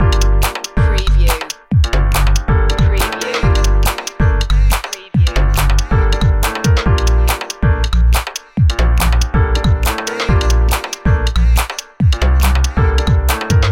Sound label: keen tweaks loop